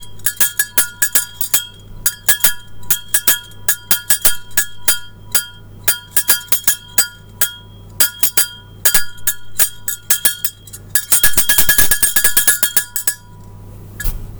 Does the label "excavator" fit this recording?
No